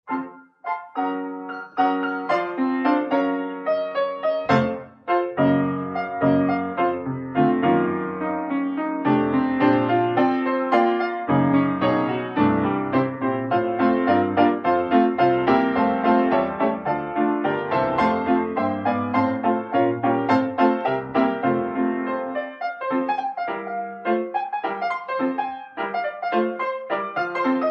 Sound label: band organ, Nursery Rhyme Melody